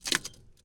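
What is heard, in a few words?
fokker d vii onboard various cockpit instruments cockpit front panel; dpa4061; Sonniss GDC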